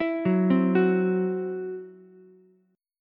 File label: E Suspended 2nd Sharp 5th, Ukulele; Esus2#5 arpeggiated